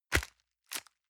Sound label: envelope grab release hard